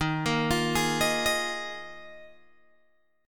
D sharp Suspended 2nd Suspended 4th D sharp Guitar Open G